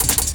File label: Pickup, Drums & Percussion; Sonic Foundry (Sony Creative Software); Futurist Drum & Bass